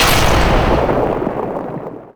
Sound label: break; portland